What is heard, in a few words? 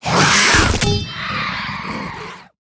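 goblin king die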